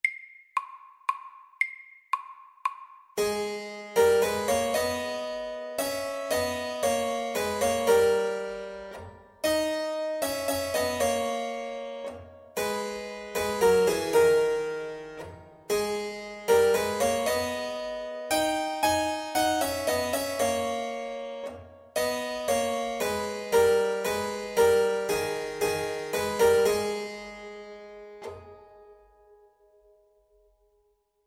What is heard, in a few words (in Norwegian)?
Menuett MIDDELS; Metall menuett